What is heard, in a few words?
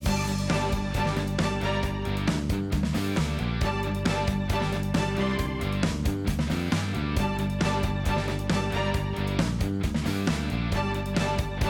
Event Suspense Heavy